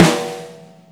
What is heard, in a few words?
fluffy snare sample e key